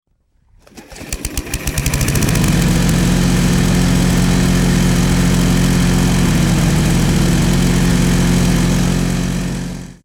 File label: Lawn mower